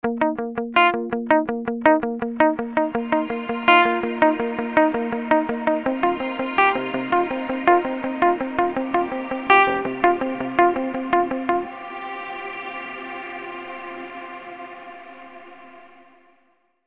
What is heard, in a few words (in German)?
Gitarre Funk Wobble Pre Delay Color